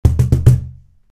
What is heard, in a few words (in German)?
cajon doppelbass; creaktor; mozee